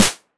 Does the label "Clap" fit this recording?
Yes